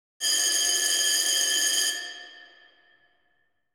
Antique electric school bell ringing vintage

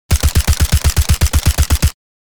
Spec ops assault rifle full auto fire; war

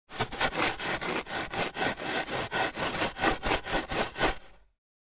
Digging in sand with a metal shovel fast

